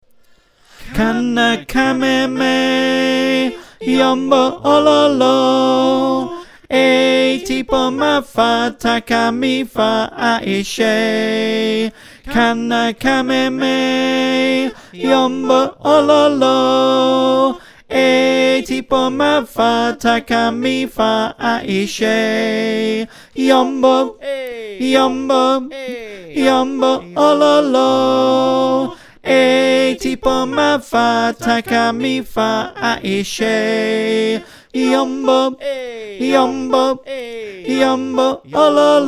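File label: tenor, choir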